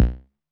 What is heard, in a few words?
808 BD short, Roland-808 Drum Hits Bass Drum